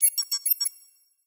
Accent, Interface, UI, Data, Chatter, Warble, Resonant, Clean, Pumping, Medium Slow; Interface Accents